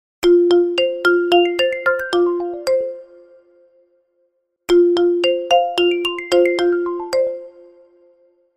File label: vivo iqoo ringtone